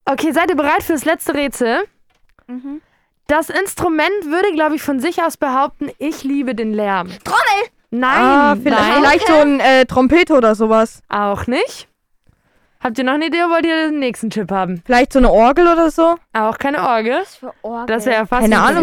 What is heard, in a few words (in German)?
Musikrätsel Schlagzeug